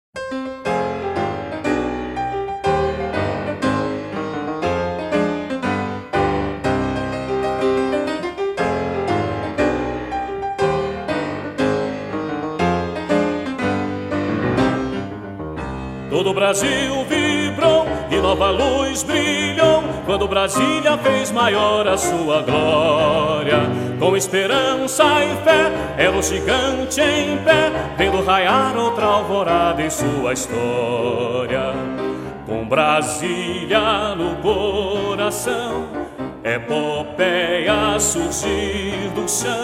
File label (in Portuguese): Piano com solo